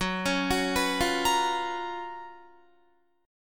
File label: Gb Major 11th Guitar; GbM11 arpeggiated; JGuitar